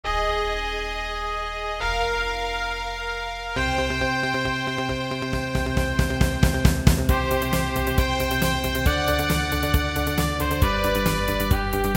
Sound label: battle